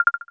Clear, Samsung SGH-i600

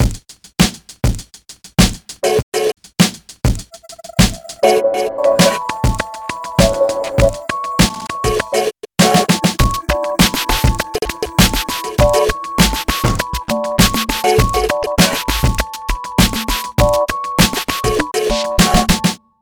Fades, phonetones Nokia

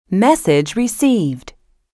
SMS, phonetones; GM630

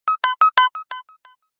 Jump, notifications; Meizu MX4

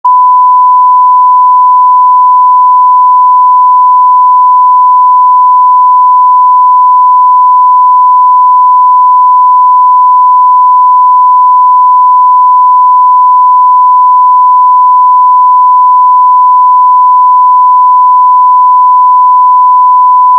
1kHz 0dB; Samsung SGH-Z720

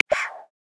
Short-Eared Owl; Xiaomi MIUI-14

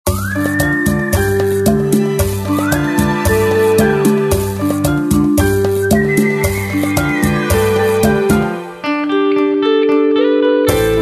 beach hut, Motorola; A3100

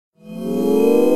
Fold open; Nokia N92

